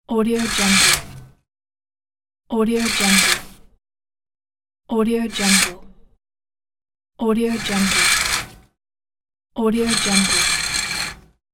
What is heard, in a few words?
curtain